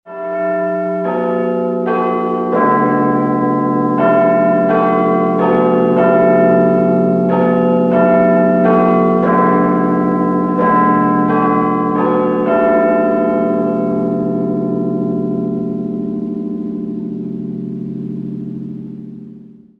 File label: big ben ringtone